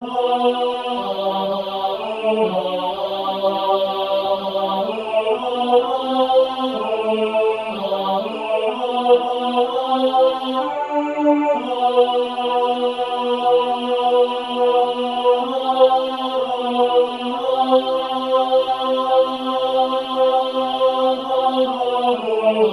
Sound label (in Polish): Tenor na tle SATB; Idzcie na cały swiat